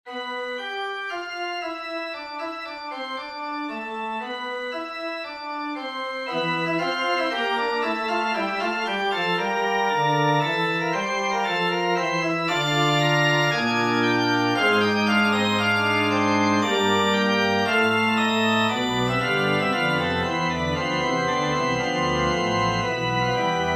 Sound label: Locrian Fugue